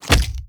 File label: shut soft, zombie blind, jaws; Half Life Alyx; creatures